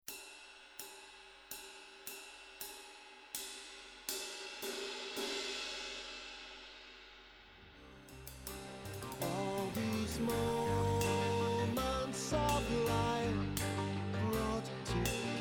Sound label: ride cymbal; MB20EDMR